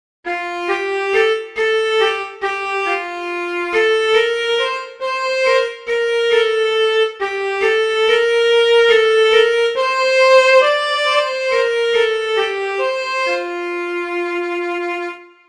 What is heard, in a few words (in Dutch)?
Viool, Ik heb een stuiver in mijn hand; Toontje Hoger